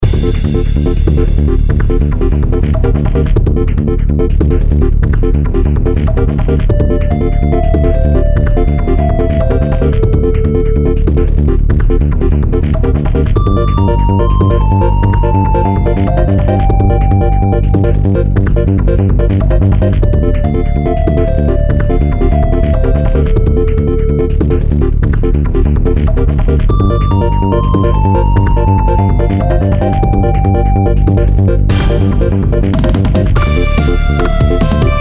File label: bgm; ＢＧＭ：☆ フィットネスジム